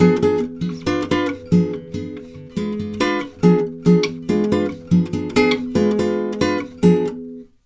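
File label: 140 guitar F DIM, Brazil Bossa; USB Soundscan; AKAI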